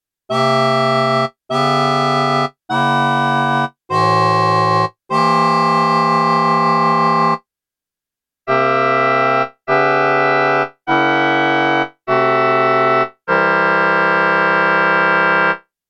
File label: Hexany Progression